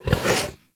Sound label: interact stand scratch sniff, Kubrow; Enemies; Warframe; Tame